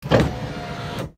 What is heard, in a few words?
Car slide open; Nokia; Audio themes